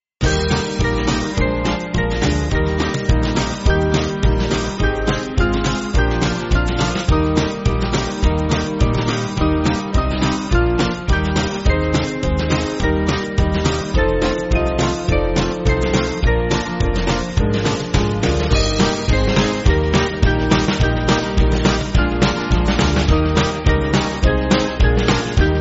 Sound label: Band, Music Backing